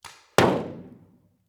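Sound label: Paintball Gun Impact; Sound Ideas; Guns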